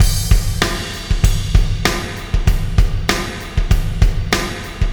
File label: Beat, Processed Drumkits; Sonic Foundry (Sony Creative Software); Processed Drumkits Zero Gravity Beats; Solar Flare Beat